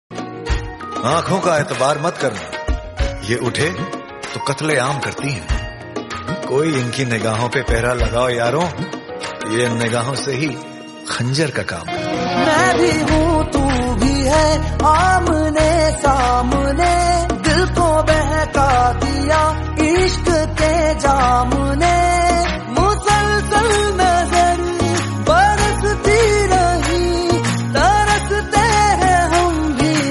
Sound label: hindi ringtone instrumental bgm